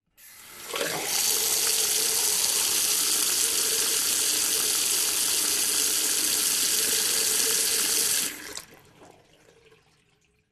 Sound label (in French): robinet